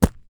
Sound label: fighting game source foley footsteps gravel ground player; movement